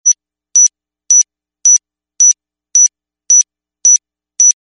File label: clocks timer steady electronic beeps